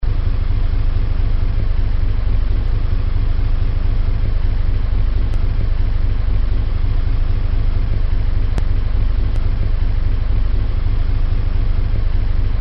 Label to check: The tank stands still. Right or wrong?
Right